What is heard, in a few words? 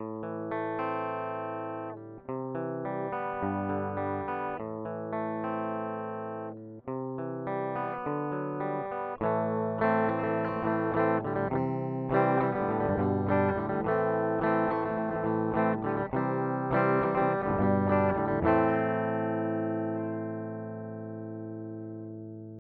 ik multimedia axe io clean guitar